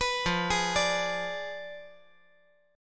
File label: F Diminished 7th F down